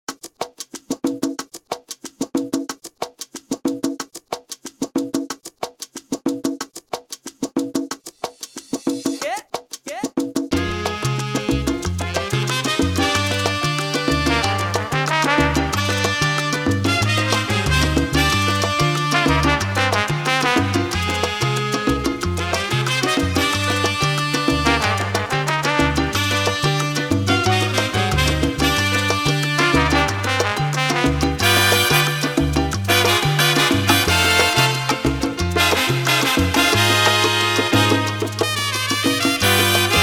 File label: remix intro 91 BPM